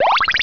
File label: PAKBEEP